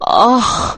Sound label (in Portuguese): mensagem safado ringtone